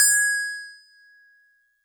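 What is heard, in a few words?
StarPiano DX100 G#6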